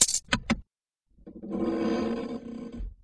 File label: open box